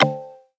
Phone Notification Sound

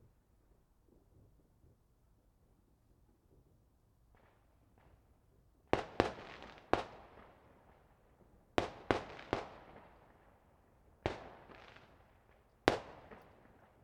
Multistage Whistling Fireworks

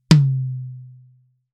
Pearl Mlx Tom

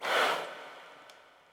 Breath

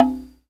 Tone Hit

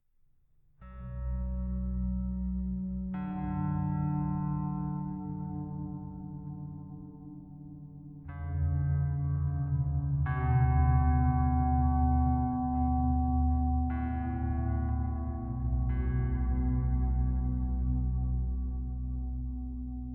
Somber Horror Ambiance Motif; For Game Tv Or Film

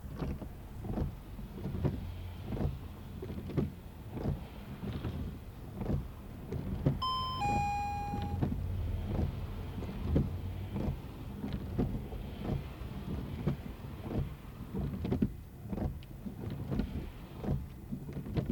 Windshield Wipers

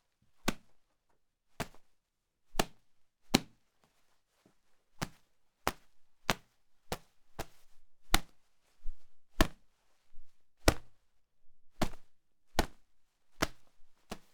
Glove Hit

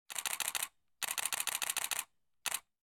Digital camera

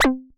Woing

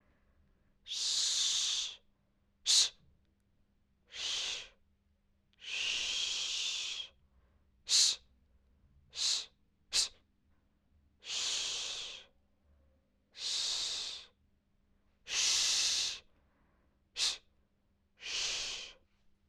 Shhhhh Sounds

